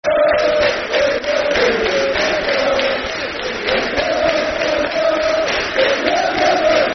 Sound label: Stand Up If You Love Yeovil, Football Chant; Yeovil Town Ytfc; fanchants